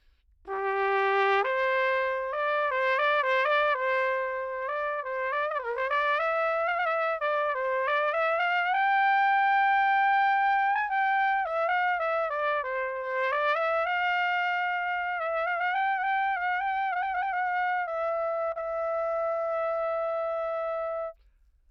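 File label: natural baroque trumpet, slurred; 1780; Anechoic; OPENAIR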